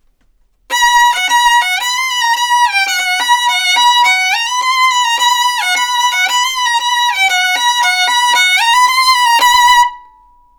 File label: violin samples Fear